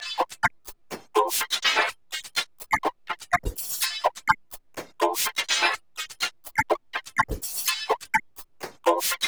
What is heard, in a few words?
Granular, LOOP 125 BPM; Bolder Sounds - Granular